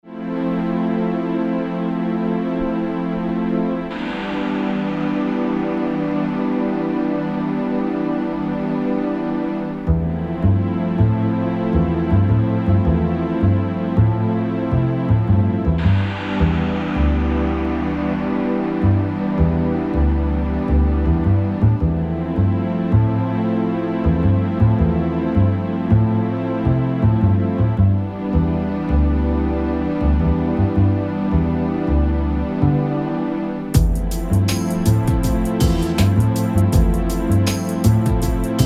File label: Relaxed